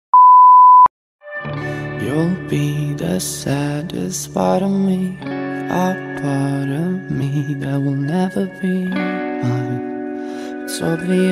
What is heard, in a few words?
Classical Mix